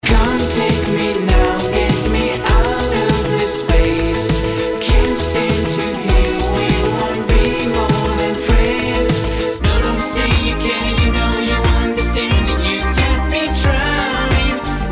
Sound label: Showreel Pop